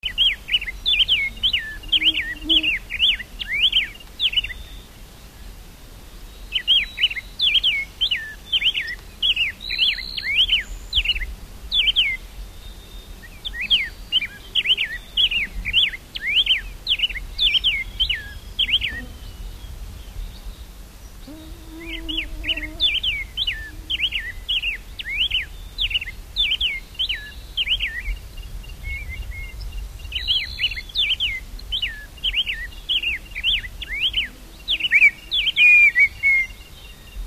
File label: Neothraupis fasciata